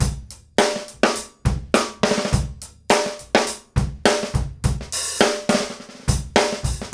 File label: GROOVE; E-MU Producer Series Vol. 8 – Platinum Phatt